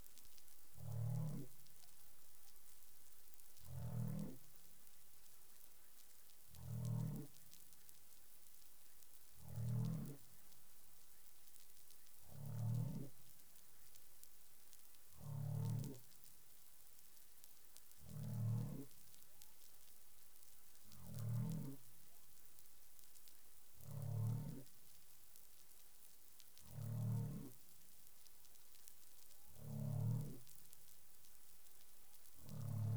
humpback whale; CARIMAM

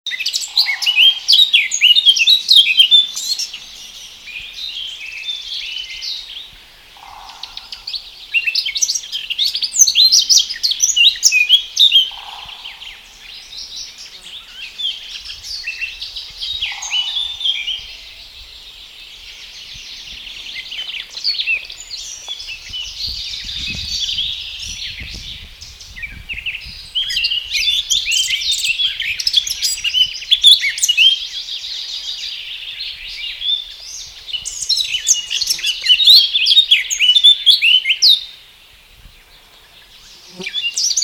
Sylvia atricapilla; SETE

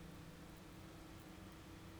pulses, BALAENOPTERA; MONACO 2022-07

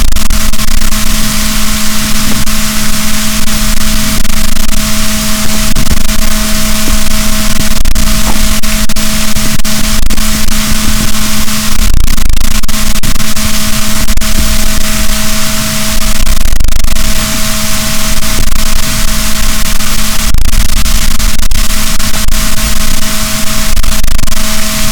rorquals negatif, boat

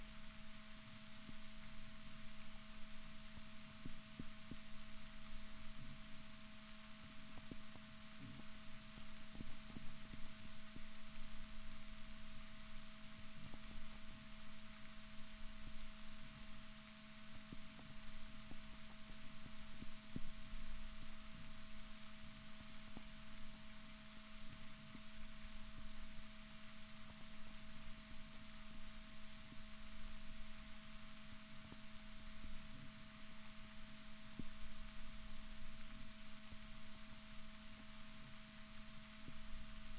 rorquals negatif, Balaenoptera Physalus Chile

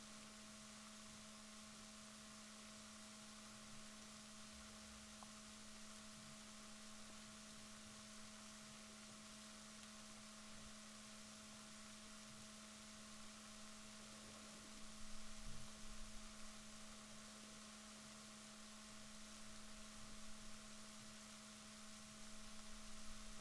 rorquals negatif, Balaenoptera Physalus Chile

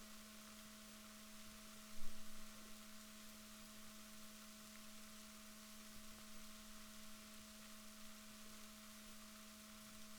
rorquals positif, Balaenoptera Physalus Chile

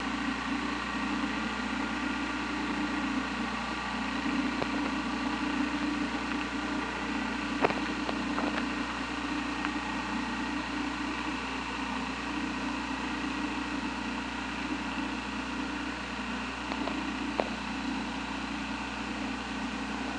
OrcaLab

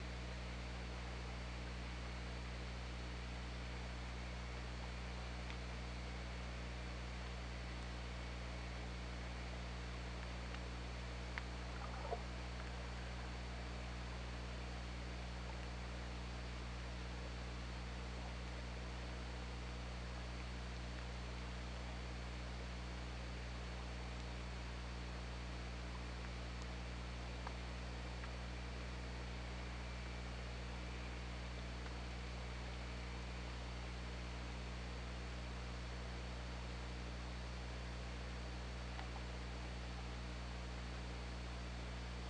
OrcaLab

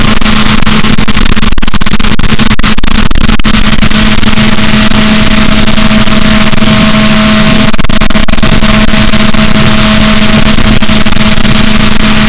rorquals, Balaenoptera Physalus, boat

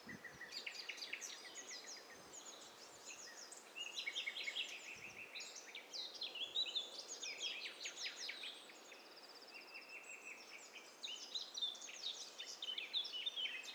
STEREO BIRD COCKTAIL PARTY

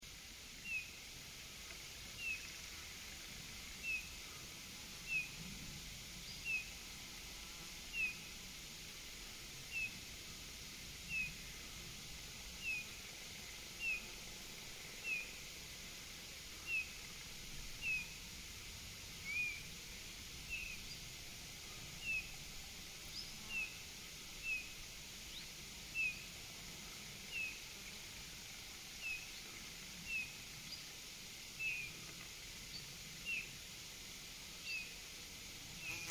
Pyrrhula pyrrhula; SETE

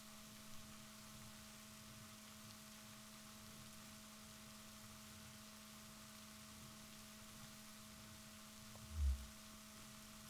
rorquals positif, Balaenoptera Physalus Chile

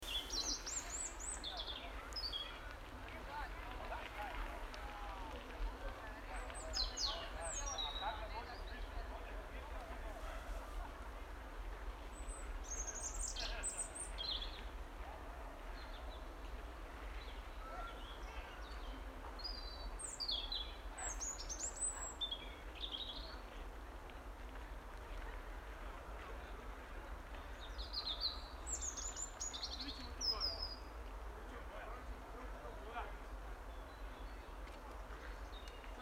Erithacus rubecula; SETE

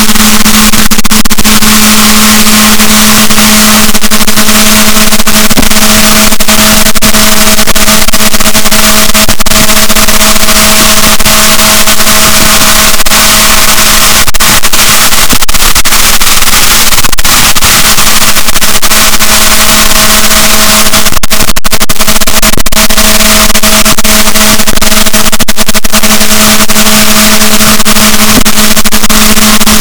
rorquals positif, Balaenoptera Physalus; boat; SNR -6dB

